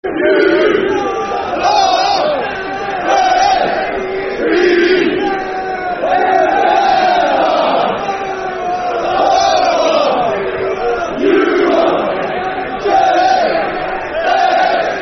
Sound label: Football Chant (Soccer Song); Bournemouth We Love You; Afc Bournemouth Afcb